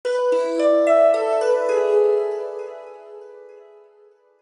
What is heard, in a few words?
airstream